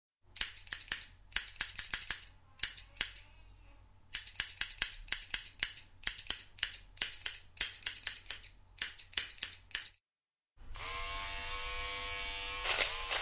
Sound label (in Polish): Efekty nożyczki maszynka elektryczna suszarka